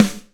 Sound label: raw steel snare drum sound g sharp key, Royality free snare one shot tuned to the G# note